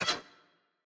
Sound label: Jaw Pin; Puzzle; Monkey